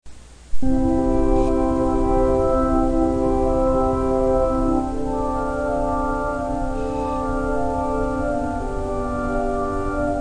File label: Ave Maria, organs